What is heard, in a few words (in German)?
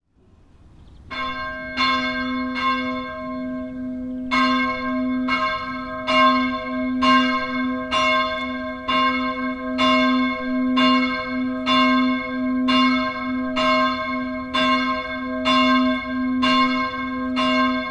glocke; friedhofsverwaltung